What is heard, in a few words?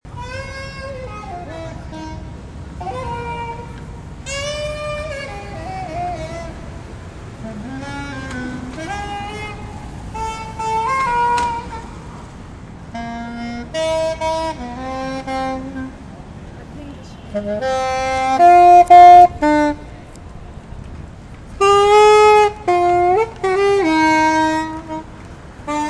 sax; 11th & E St.